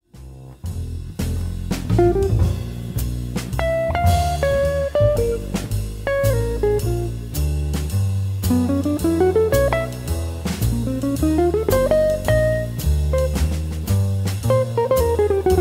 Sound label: Blues